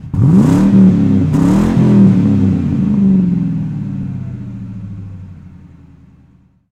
99 Mustang Rev, Automobiles

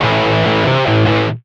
Pickup Guitar Riff; SFX Kit; Pickups

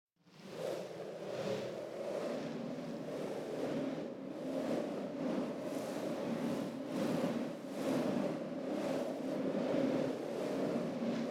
Wind Ambience, Thin Fast Whooshes, Some Rustling; Hollywood Edge; sound designer's toolkit v2

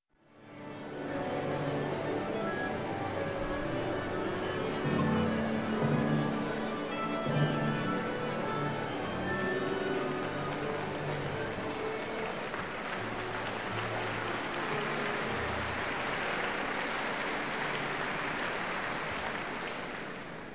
Orchestra tuning, concert Master Enters, applause; keying Up; Sound Ideas 2000 Series

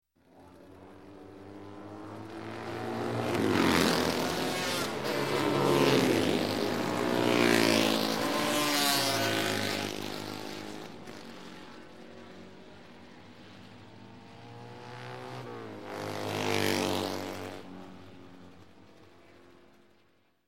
Motorcycle, Race Supervintage Pass By, announcer; Sound Ideas; Series 2000

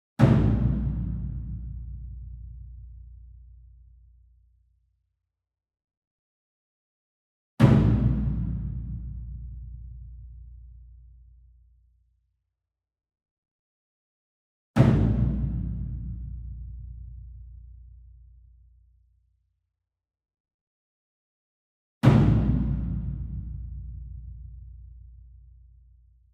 Gong Hit Hard Beater Far; SINGLE DRUM; boom library; Cinematic Strikes Construction Kit

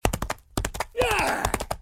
attack; Blind Gladiator; Torquatos

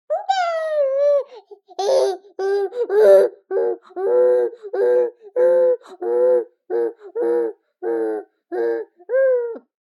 BABIES WHINE Boy 3 months Unsettling; Baby Boom

